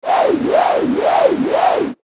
wawa bruit

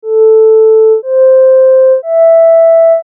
la do mi

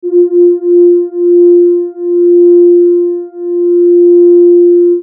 accord uniss

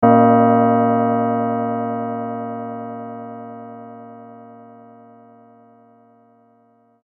cloche

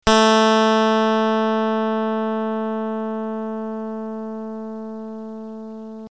clavecin

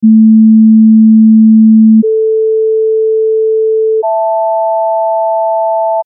filtre tri

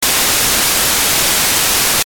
bruit

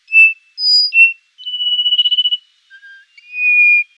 siffleur

